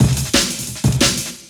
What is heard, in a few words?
amen, breakcore breaks; DOA breaks